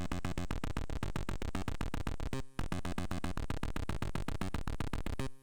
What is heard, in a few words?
first techno